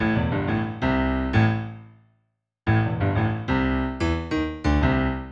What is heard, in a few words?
Piano Bass line